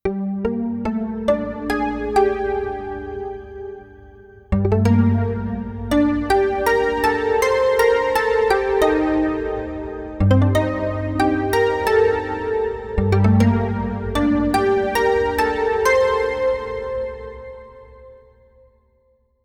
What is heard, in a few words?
Roland Gaia2 Pluck